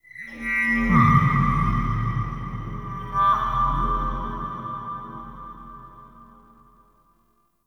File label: Tech Texture Large, Ambience; Warframe; Cephalon Weave